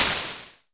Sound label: SN Basic, Vermona Mono15 Drums Snare